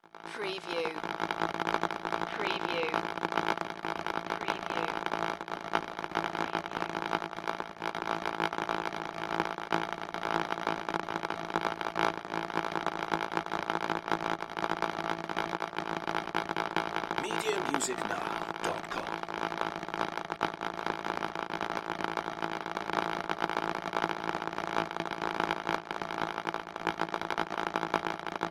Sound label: Electric Arcs Constant; Sound Effects Bible